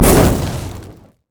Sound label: burning agony; Dr. Mundo